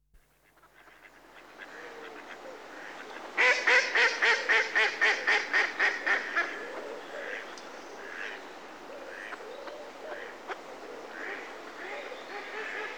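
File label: Mallard (Anas Platyrhynchos) Close; Birds; BBC Sound Effects Library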